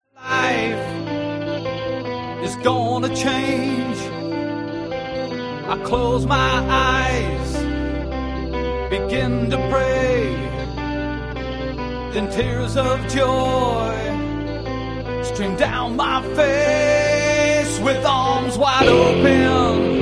play along drums rock pop and blues minus drums

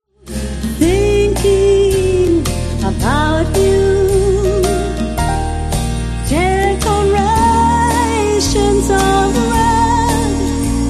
jazz and jingles

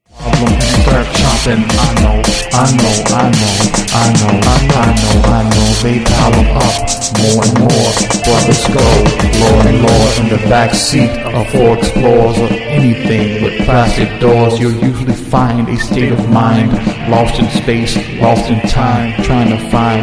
heavy metal

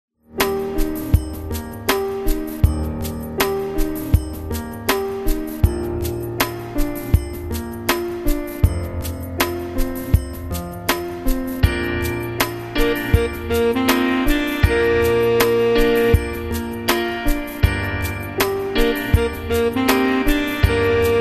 late night sax

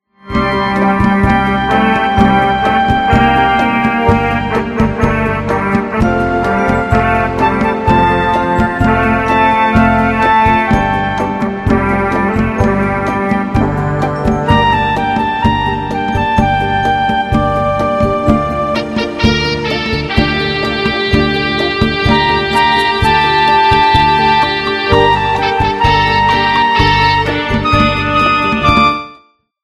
flute fantasy

